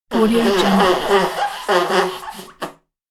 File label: blowing nose